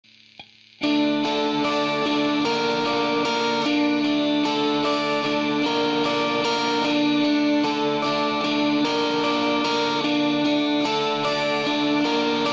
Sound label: guitar amp